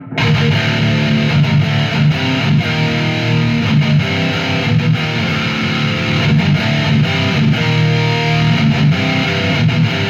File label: METAL